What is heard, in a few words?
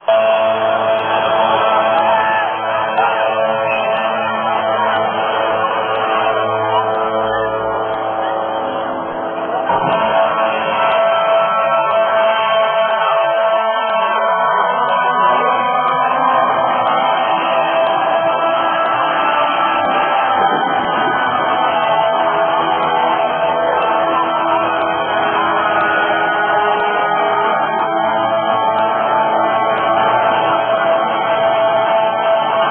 Adagio for Strings